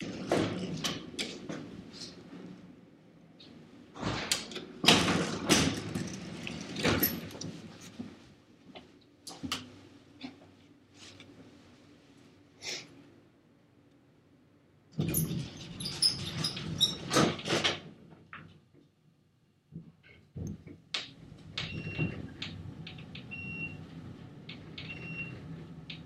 Elevator

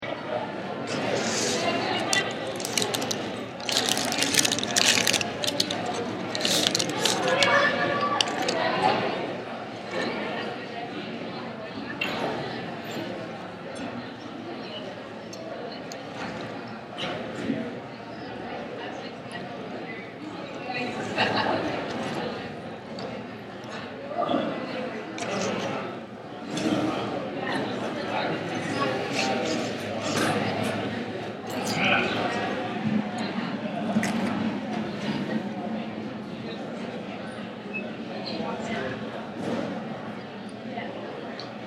Student Center Cafeteria, Laughing

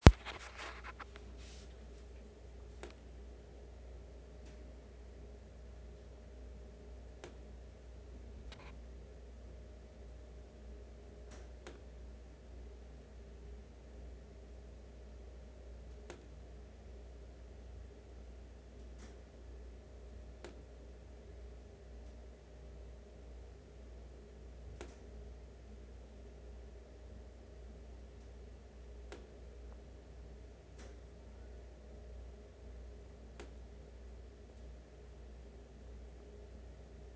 drip

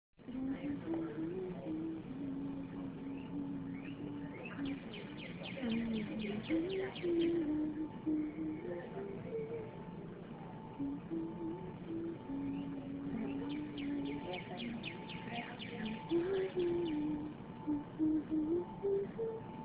On the Quad

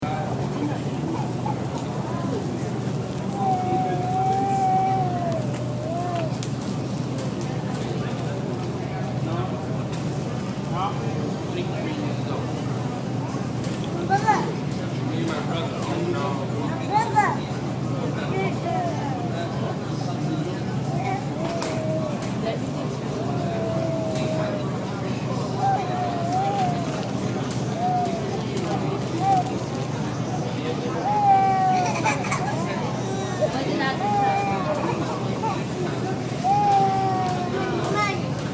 Ferry, Staten Island Ferry You can hear people; 2/10/12 11:37pm Staten Island Ferry You can hear people talking, babies babbling, crying, and coughing

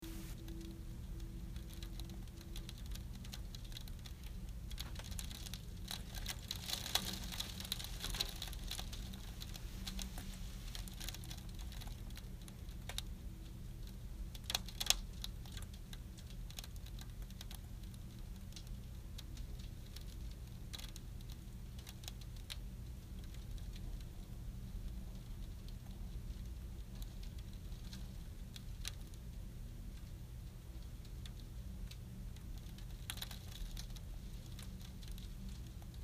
Snow Hitting Window

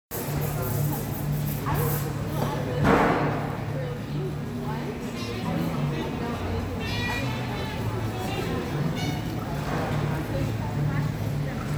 Morning At Starbucks

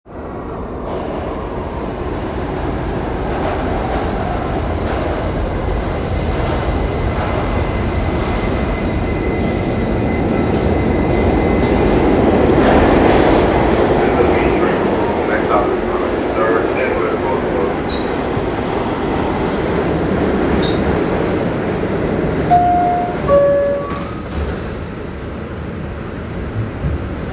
Subway; Location: The subway, NY City

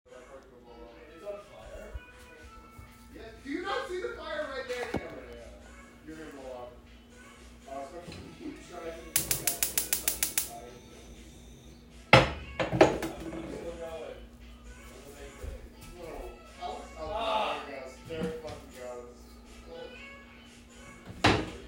Kitchen; Location : Hofstra USA

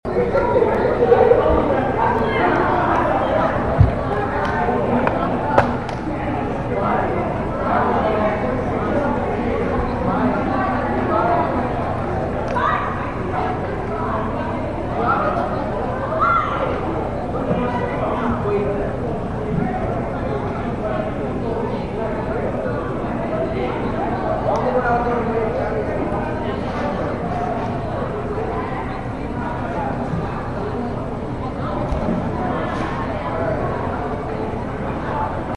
Student Center; People talking/yelling, echo of the people's voices, container opening, chewing

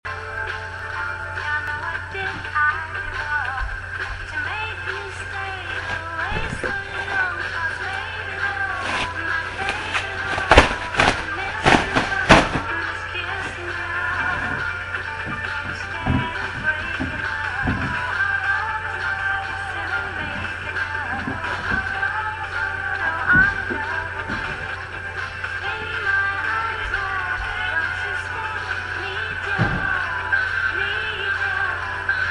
bedroom; my room

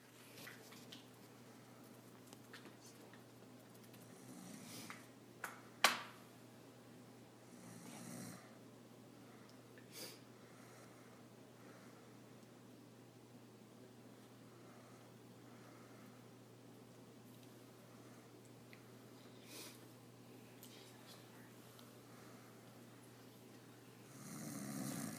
Lounge

